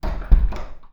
Door Low Closing

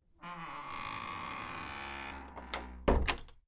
Squeeky Wooden Latch Door

